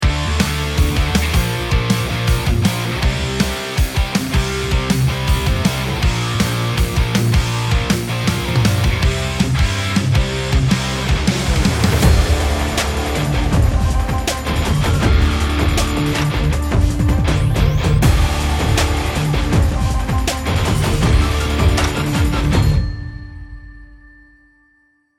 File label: bomb planted; perfectworld